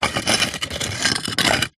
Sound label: foley brick slide on other brick short movement